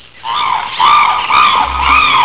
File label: parrot